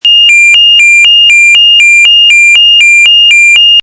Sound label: beep